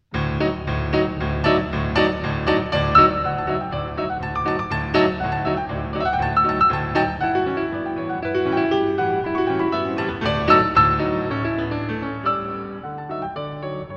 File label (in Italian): Polka